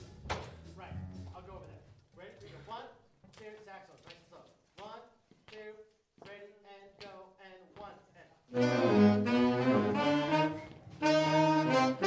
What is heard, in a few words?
saxes at G